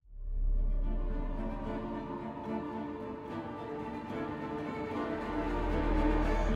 mood intro; gwen

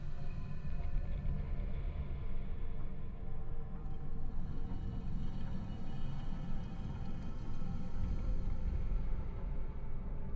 amb weapon room; sentinelhq

